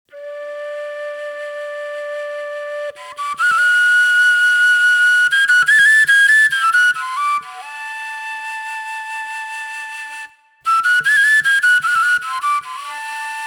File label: Overtone D